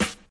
sizzle snare G sharp key, snare single hit tuned to the G# note. Loudest frequency: 2584Hz